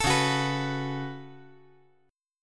C sharp 7th Suspended 4th Sharp 5th

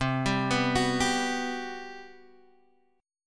G Major 7th, C; arpeggiated; JGuitar

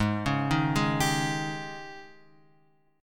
G sharp Major 7th Suspended 4th G sharp; G#M7sus4 Chord {4 4 1 1 X 3} arpeggiated

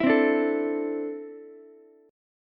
D 7th Suspended 2nd down Ukulele; D7sus2 strummed

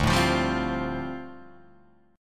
D Minor 11th D down Guitar DADGAD

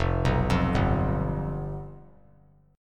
F Suspended 2nd Flat 5th, Fsus2b5 chord; arpeggiated; JGuitar

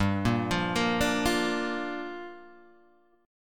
G Minor 7th, Guitar, Half Step Down

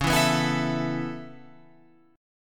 Db Minor 7th Sharp 5th Db down Guitar Open F; strummed; JGuitar

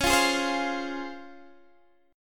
Db Major 7th, Guitar, Drop D; strummed

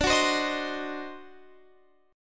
D 7th Flat 9th D down Guitar Open F; D7b9; strummed

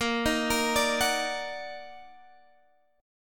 Eb Minor Major 7th Bb, chord

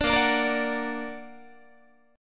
A sharp Augmented A sharp, Guitar; A#+ strummed; 17,18,17,20,18,17; 4A,4E,5A,5D,5G#,6C#; guitar chord

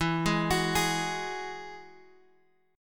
E Major Add 9th, Guitar Open G

